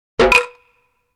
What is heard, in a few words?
electronic bonk; music electronic; Asian Show Sound Effects